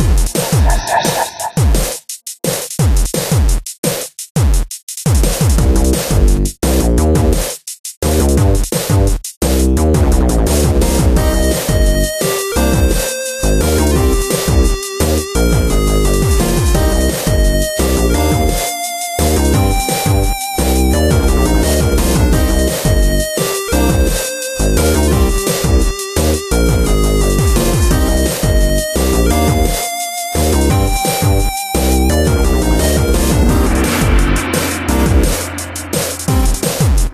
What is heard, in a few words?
Boss Loop; Yet Another Pixel Dungeon; Android